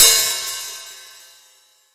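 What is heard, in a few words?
MIX open hi-hat, OLD HH; AKAI S-Series CD-ROM Sound Library VOL-3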